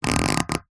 rubber tape stretched across metal squeak rub; foley; zapsplat

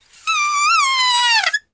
foley balloon air release squeak; sound effects one

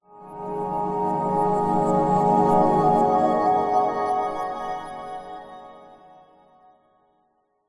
fantasy reversed backwards magical glissando

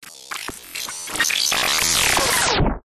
science fiction robot glitch malfunction; zapsplat

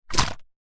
cola bottle plastic shake little cola short fast; food drink

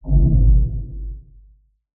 impact underwater or space medium iron vibe

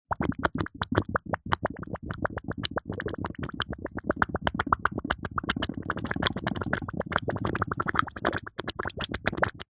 cartoon slime goo bubbles swamp; zapsplat

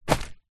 foley flowers fake plastic set down carpet

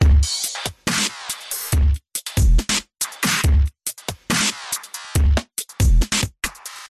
musical drum loop electronic 140bpm; Audio Mangler

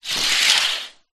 household curtains net open or close

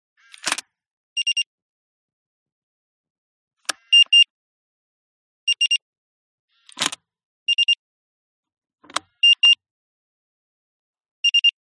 bicycle lock electronic lock unlock with beep

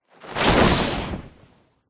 superhero fly by fast whoosh cape; fantasy; zapsplat

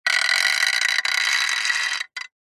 metal stress creak thin tone; zapsplat

